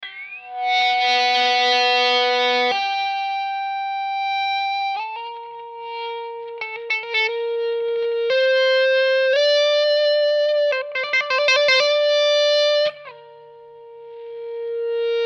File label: Aeon Overdrive